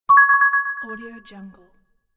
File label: success notification